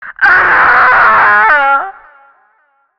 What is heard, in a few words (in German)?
Schrei Mann